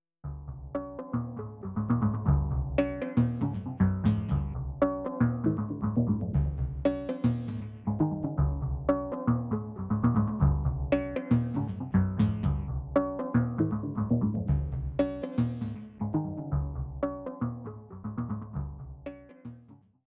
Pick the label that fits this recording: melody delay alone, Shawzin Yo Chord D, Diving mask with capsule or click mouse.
melody delay alone